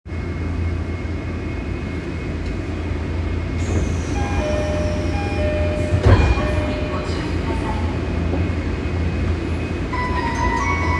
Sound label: train sounds; 有楽町線市ヶ谷東